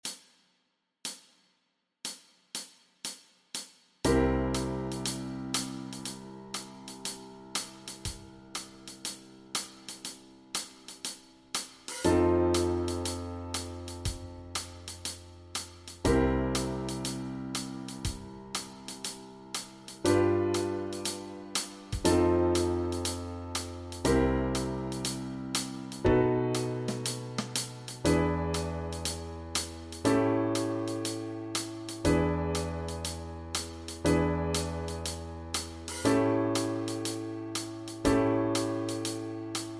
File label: Backing Track, walking bass